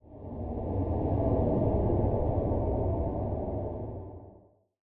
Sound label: scifi wind